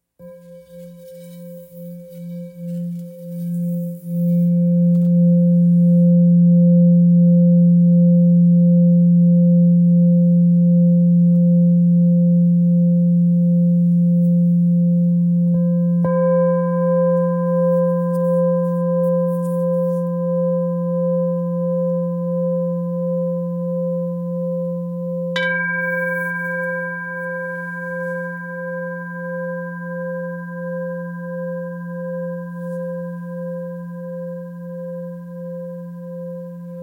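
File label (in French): Bol thick fa, bols chantants tibétains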